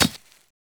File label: hit other, combat knife; weapons; props dane